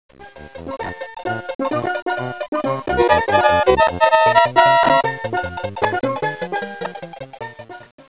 ending; goomba

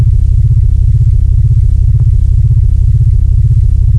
core far; power struggle

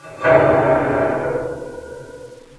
long ambient stress

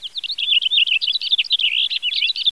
bird intro

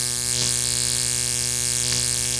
electric

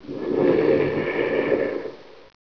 growl

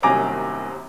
piano

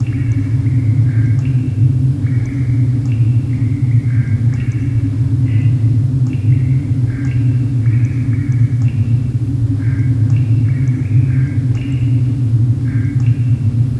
wide cave; firedamp

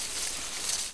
bush

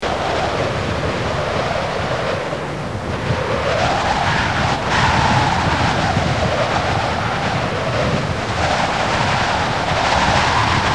storm

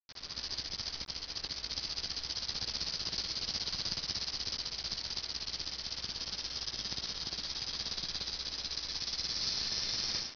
snake

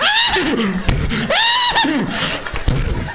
horse attack

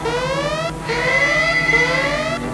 alarm; chaapaai

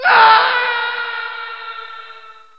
fall scream; dm secretgarden

